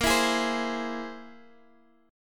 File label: C Augmented 7th Bb